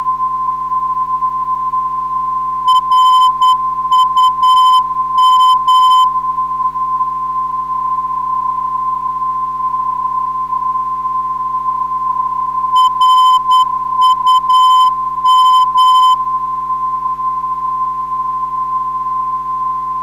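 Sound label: 320kHz, non-directional beacon; RUM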